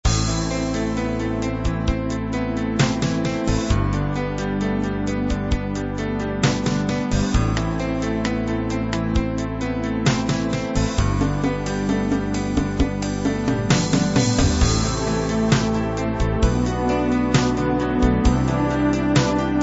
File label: new age